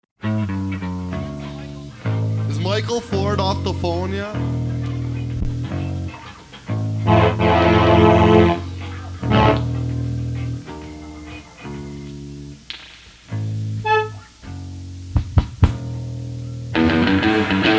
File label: Instrumental